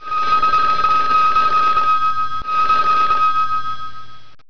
telefon

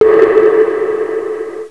Echo

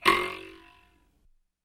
bow string

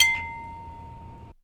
glass clink

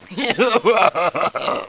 MAD LAUGH

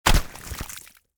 rock hits water

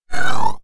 cat

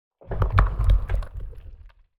ice block crack

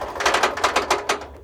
metal stress

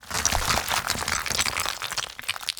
rock fall

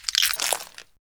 step walk broken glass

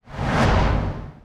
fire swoosh

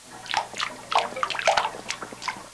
water drip

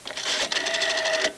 rotary phone dial

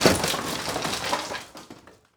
wall crash

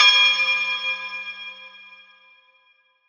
bell creepy

